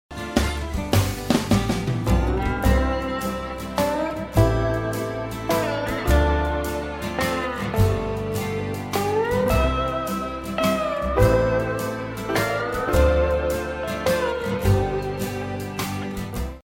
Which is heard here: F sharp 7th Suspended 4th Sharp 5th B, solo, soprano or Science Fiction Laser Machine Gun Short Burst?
solo